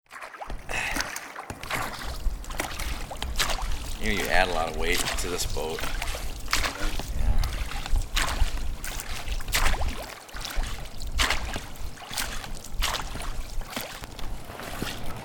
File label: Rowing